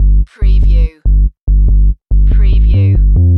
Techno-Trance Sub Bass Loop in G 142 BPM

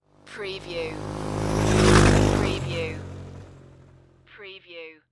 Motorcycle Dirt Bike Drive By Right To Left

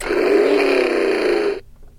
Toy Car Ignition

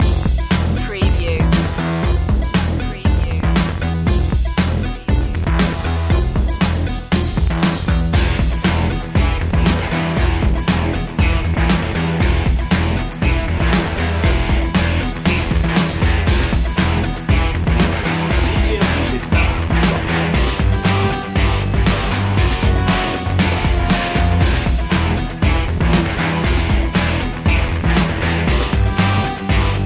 thunder loop

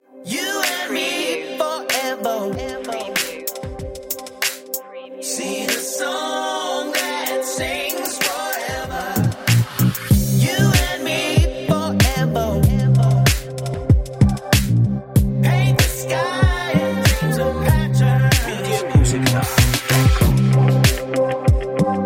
dark indie electro

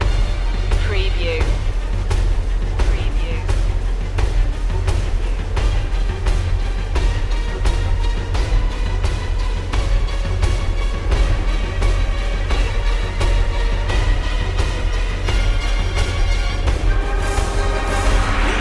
no choir

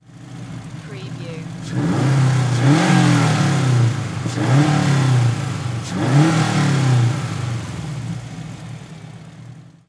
SUBARU STI ENGINE MULTIPLE REVS

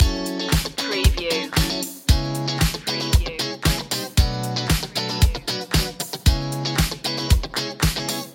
showtime loop

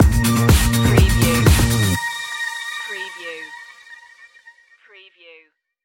streamliner stinger

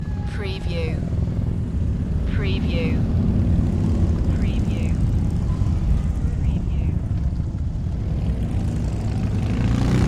Biker Gang

